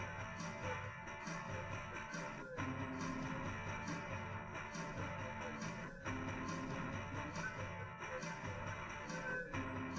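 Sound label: with drums live rec 250 ms 1 m; SiSEC10; underdetermined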